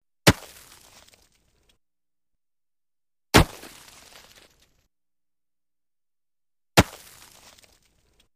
Chop Ice On Lake Shore, Axe Or Pick Into Solid Material, Some Ceramic Tone 5x